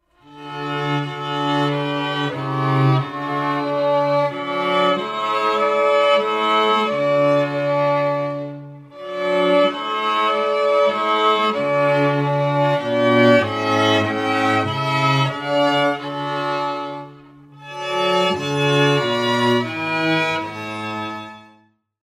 Steirische Arie, volksmusik

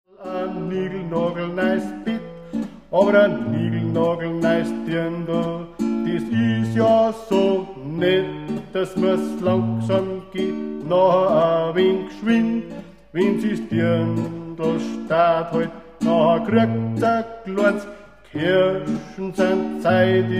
Drum; A Durchanand

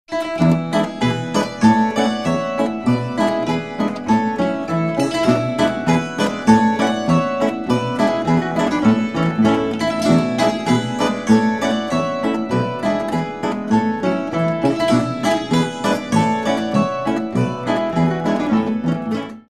Tanzweisen Saitentrio Wirtshausdreher

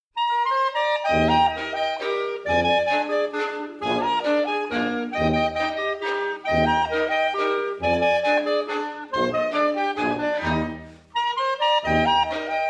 Laendler

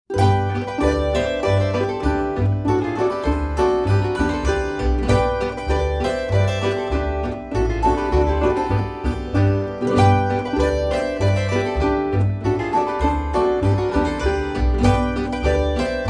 Saitenquintett Polka

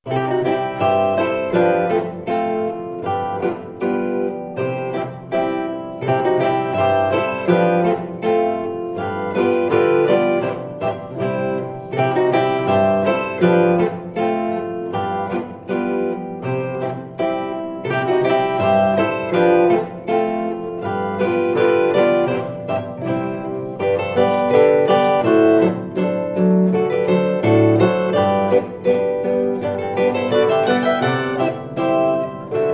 Tanzweisen drei Polka

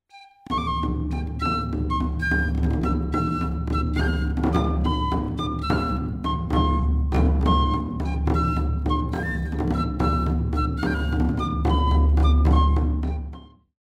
Marsch

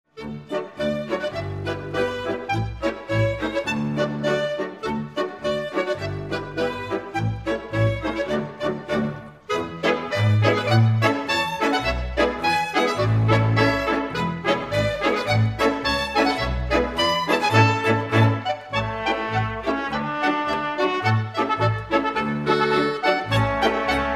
Schottisch

